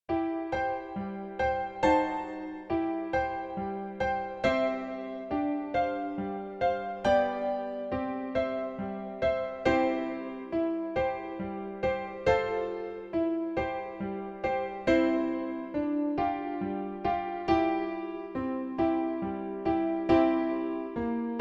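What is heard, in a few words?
wooden horse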